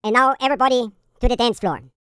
ENGLISH, YODEL YELL, World Voices; ILIO; Vocal Planet

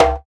SUNU, African Perc; Spectrasonics; Supreme Beats; African Contemporary; Afro DRMs

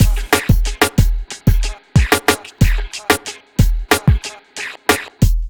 BEAT, 92, Hip Hop R n B; USB Soundscan vol.19; AKAI; TIMBA

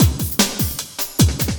LOOP, Drum & Bass, 150 BPM

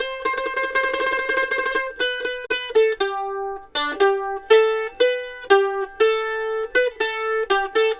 Mandolin Lead; Continental Drift Aftershocks

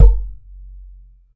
JUG HIT

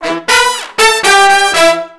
Disco Fever, BRASS-LICKS; Best Service ProSamples vol.48 - Disco Fever; AKAI

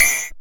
A#, Synclav FM PERCUSSION; Big Fish Audio

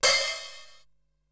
Open Hi-Hat, Drum Machines Ensoniq SQ-R-Plus; 300 Drum Machines

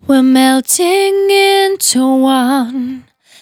Finally 70bpm C, Velvet Vocal; Zero-G

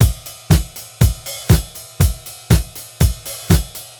beat, Roots Disco; USB Soundscan vol.32; AKAI

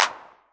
909 Clap, Novation Drumstation; Novation DrumstationV1

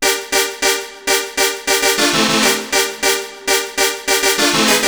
RAVING NOT, MIXED HOOKS; Masterbits - Tekkno Trax

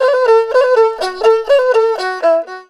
ERHU LICKS; Best Service ProSamples vol.52 - World Instruments 2; AKAI

